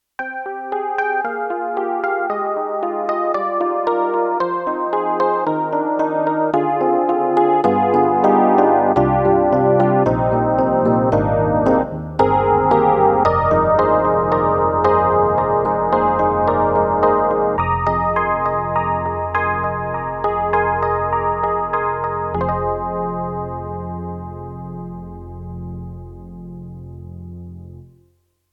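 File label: YAMAHA MU1000EX, Flops Detuned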